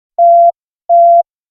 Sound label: communicator beep; star trek